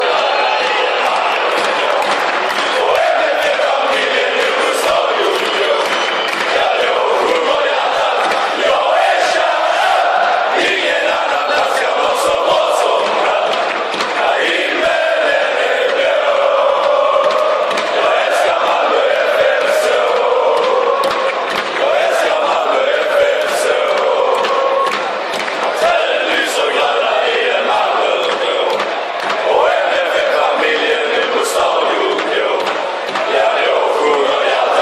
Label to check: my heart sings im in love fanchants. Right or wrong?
Right